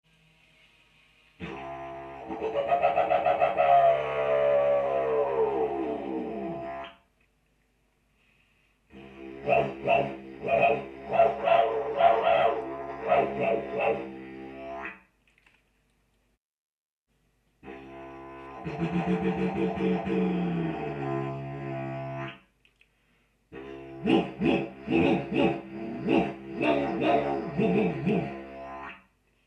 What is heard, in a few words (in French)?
cri, Les cris, didgeridoo; Faites le bourdon de base et criez "kou kou kou kou kouuuuuuu" ou "waf waf waf" de facon t